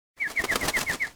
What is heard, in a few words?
pecking order miss; toontown-ceiling